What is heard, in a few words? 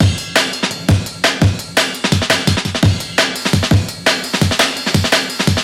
hybrid analog speak loop